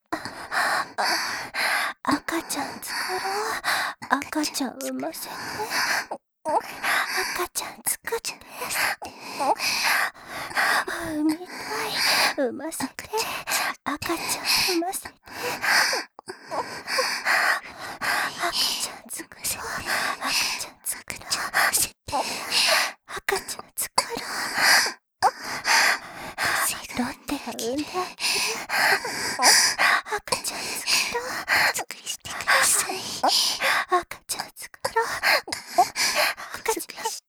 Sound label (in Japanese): 赤ちゃん作ろ 喘ぎ